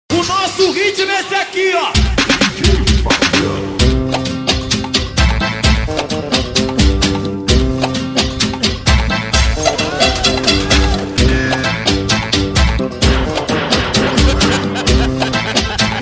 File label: funk mix